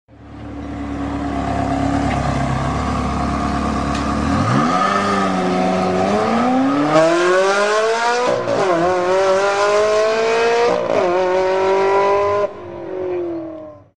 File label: Ferrari 360 Stradale